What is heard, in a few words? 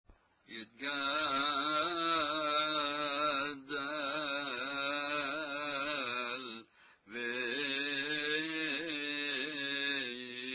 qaddish ajam